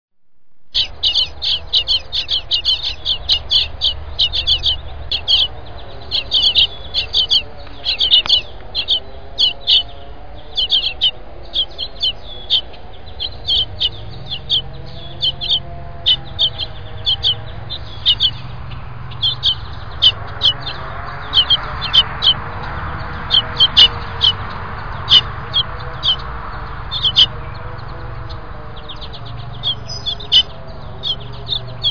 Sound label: bluebird chicks